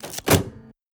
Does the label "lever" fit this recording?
Yes